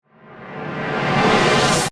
Transformation, Scifi, Transformation, Transporter, Science, Fiction; Communication; BlastWave